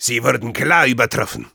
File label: cheers, de